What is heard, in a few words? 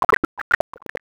stir pot